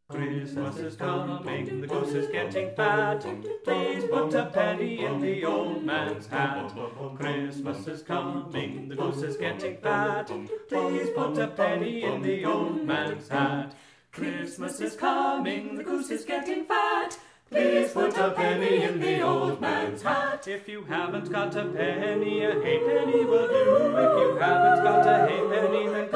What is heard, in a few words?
Carolers